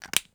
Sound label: Pen Cap Close; Office Supplies